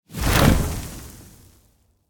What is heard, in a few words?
death coffin disappear; Smite; Baron Samedi